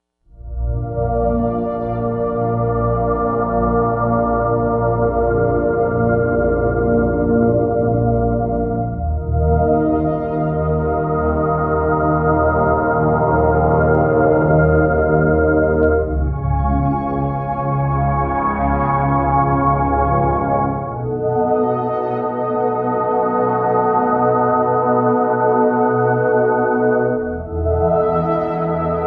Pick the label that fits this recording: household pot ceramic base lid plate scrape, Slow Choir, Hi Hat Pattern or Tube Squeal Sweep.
Slow Choir